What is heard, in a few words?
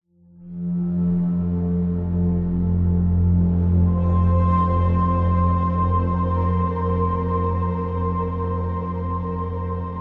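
cinematic musical drama ominous impending danger; sound design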